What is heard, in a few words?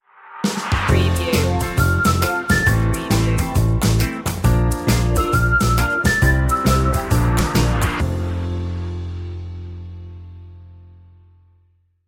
carefree stinger